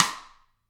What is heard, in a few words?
GRETSCH SNR, Double Platinum Drums